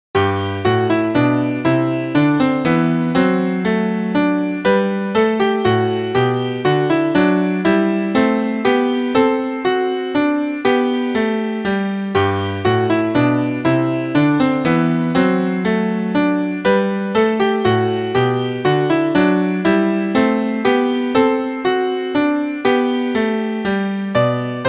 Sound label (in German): Menuett